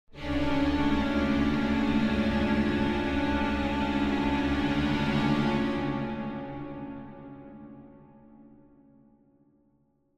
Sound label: Symphonic Hit Flowing Strings Warning; Hollywood Edge; sound designer's toolkit v2